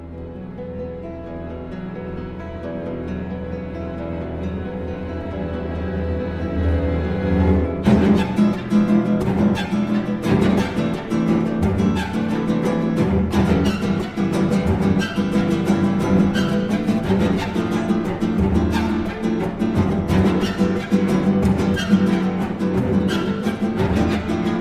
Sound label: concerto for guitar and strings; Andante Moderato Allegretto; Concierto de Aranjuez